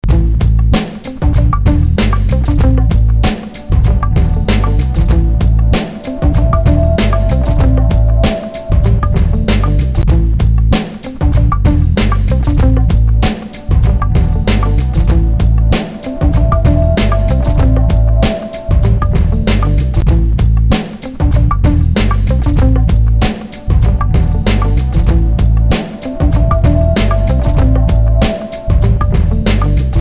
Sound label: African Loop (walking); Music For Film